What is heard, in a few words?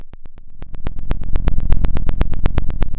Sound of a fire crackling